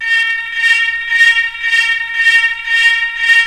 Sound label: FX; AMG Megabass REMIX! VOL-2